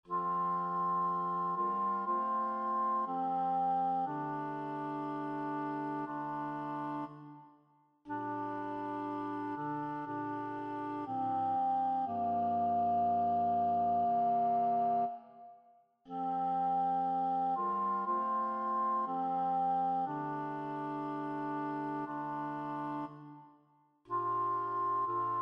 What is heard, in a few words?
alto; In the Bleak Midwinter